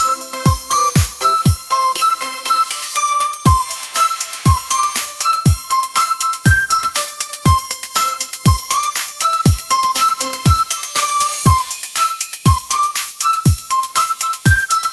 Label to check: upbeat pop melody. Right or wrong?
Right